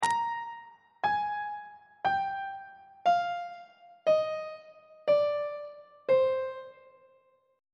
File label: b sharp minor scale descending; on treble clef